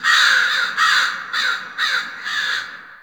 Fall Crows; Warframe; Ambience; NineteenNinetyNine

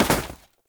Step Snow, Foley; Warframe; Oraxia

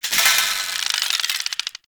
Mace Spindown; Warframe; Duviri; Dax; Mace And Shield

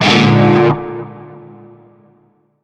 Shawzin Min Chord; Warframe; Narmer Shawzin

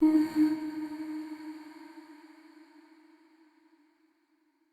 Statue Voice D Sharp; Player Instrument; Statues

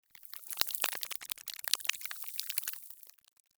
Ext Spine Move Close; Ambience; Misc Outdoor; Warframe; Infested Eris

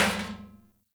Metal Trash Can Impact; Ambience; Gameplay; Warframe; Nineteen Ninety Nine

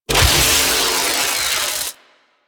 Electricity Shock Burst Fire; Oxium Osprey

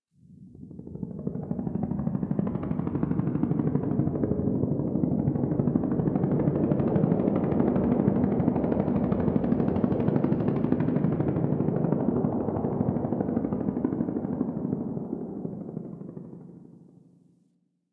Distant Ambient Helicopter

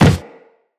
Run Wood, footsteps; Operator

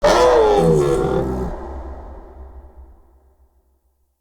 Stag Vocal Pain Long; Animals

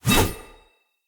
Dagger Whoosh Heavy; Weapons; Player; Swords; Fey

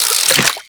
Bubblegun Pistol Reload

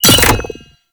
Grenade Bounce; Warframe; Lasrian Soldiers; Weapons; Lasrian Goonadier Grenade

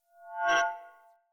Great Sword Accent; Weapons; Swords; Dendrit Ara Great Sword; Accents

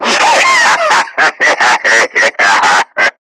Screamer Self Destruct; Warframe; Lasrian

